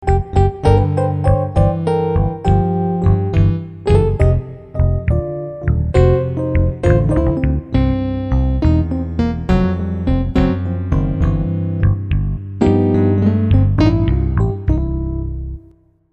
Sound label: Jazz Blues